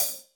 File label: HH, Drums; Bob Clearmountain Drums I